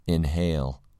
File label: inhale